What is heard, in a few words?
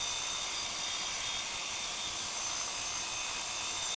white palace saw loop; Hollow Knight